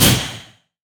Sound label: item glacial spike; LoL; items